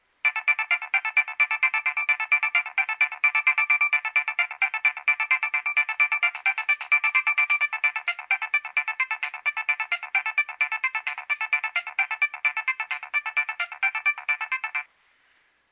nokia3100 ringtone

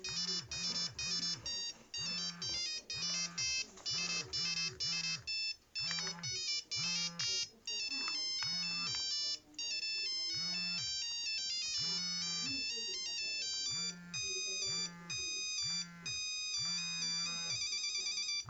nokia 5510 ringtones; mozart 40

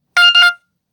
Up and Down

